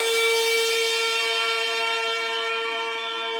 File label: synth main